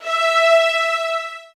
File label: SOFT VIOLINS